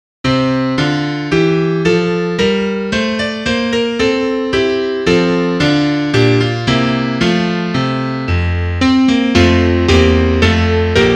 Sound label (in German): Klavier; gruess gott